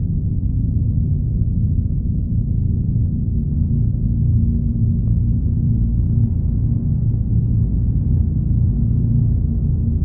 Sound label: titan ship hum; apocalypse